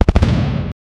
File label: an ac 130 gunship distant; military